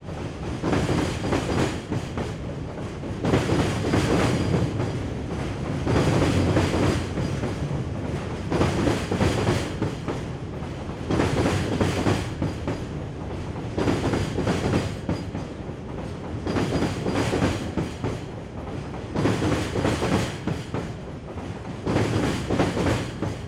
Train, Freight, Bridge, By, Away - very close perspective, crystal clear; Freight Trains; Airborne Sound; Vehicles Freight Trains 1